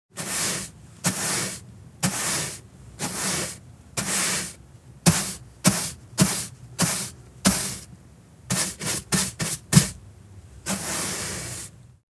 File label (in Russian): Podmetajut pol